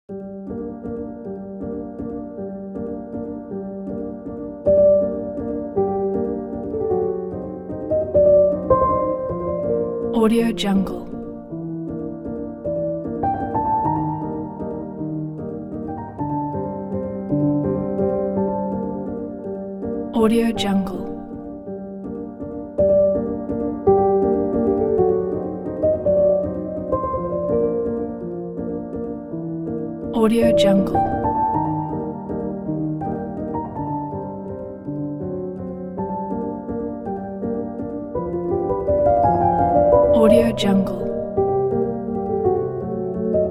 sad ballerina waltz

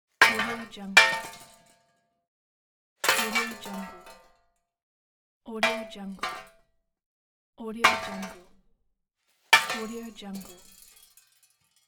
metal banging falling on ground

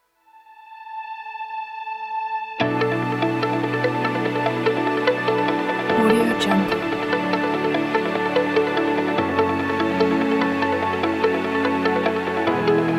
epic anthem rock

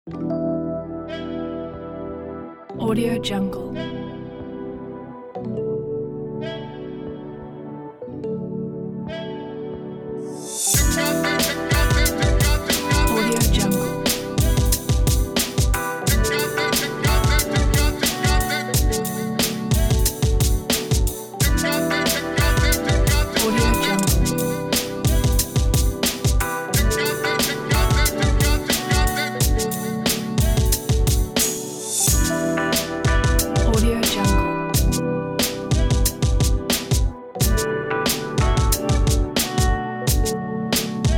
relaxed

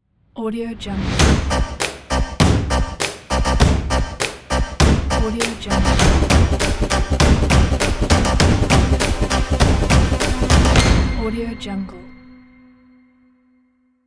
action dubstep trailer logo

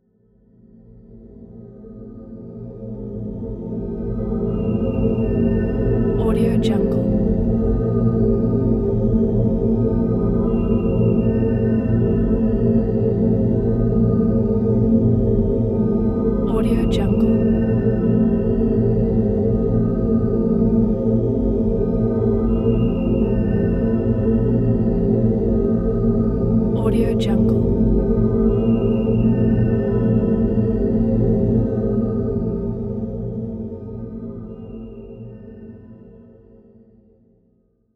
dark ambient

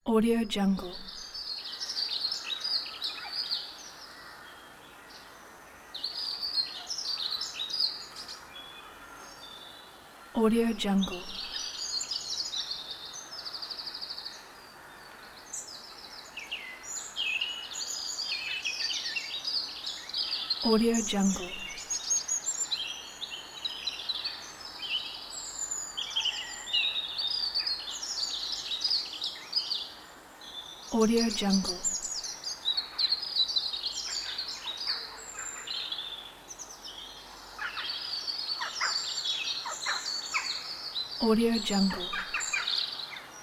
forest

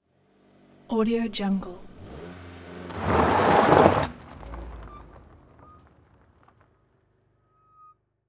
sedan small car arrive brakes on dirt and stop

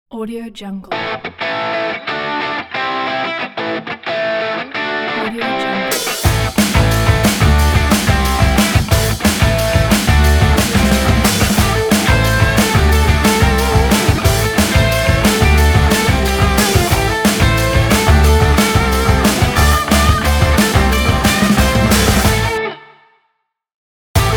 rock logo

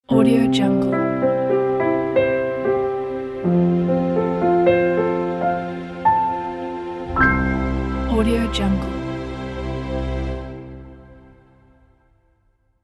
classic logo

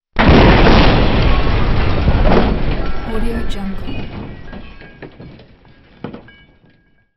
crash wood glass

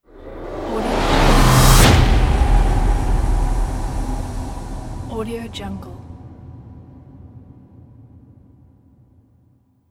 cinematic whoosh impact sound

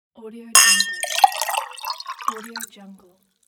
pouring alcohol in glass

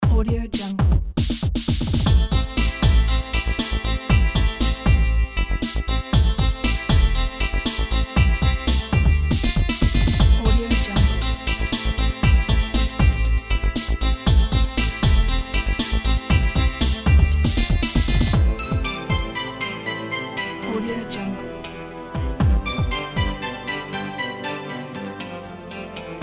happy dancing; vizard design

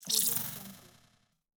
organic horror interface fleshy reveal

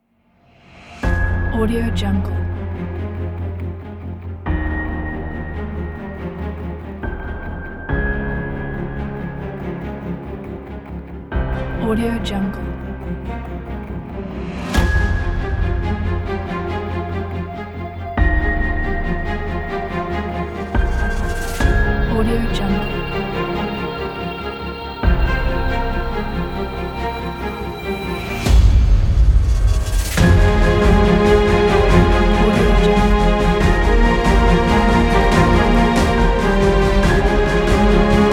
trailer epic

